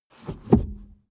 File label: suv release reverse gear; verkehr transport; autos